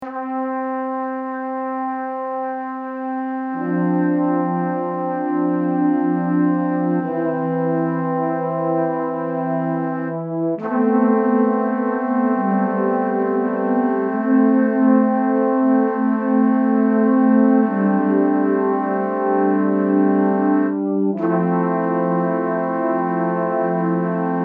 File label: trumpets horns